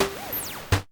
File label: Roland E Blip, Roland S100; musicradar-synth-samples